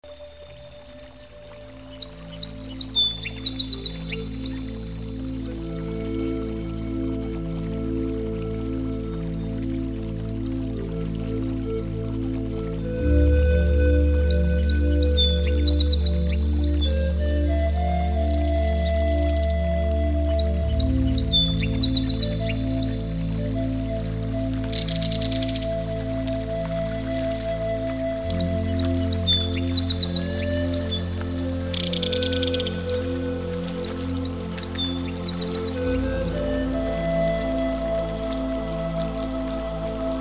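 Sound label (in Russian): zvuki prirody ruchey muzyka dlya relaksatsii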